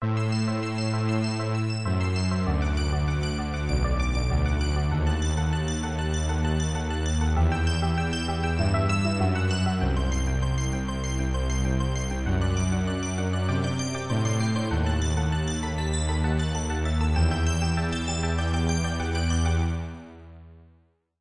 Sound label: arpeggio stringy thing